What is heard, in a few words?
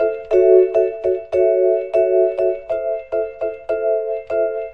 101 jazz sevenths epiano; KEYS ORGAN PIANO LOOPS